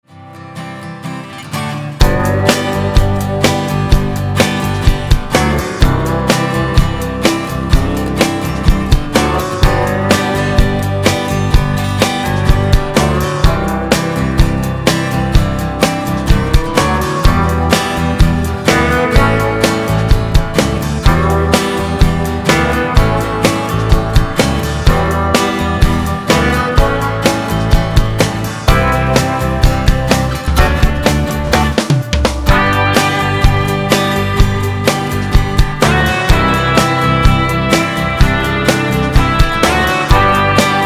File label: Country Style